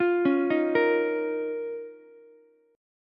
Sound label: D sharp 7th Suspended 2nd D sharp, Ukulele; arpeggiated; D#7sus2; JGuitar